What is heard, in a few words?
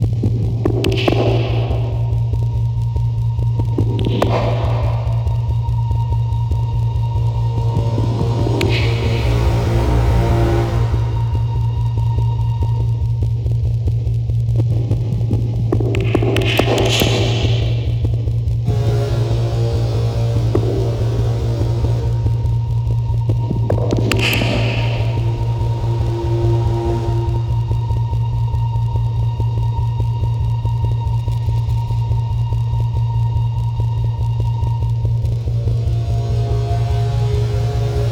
Music Tension Pulse Med Tempo; Battlefield 3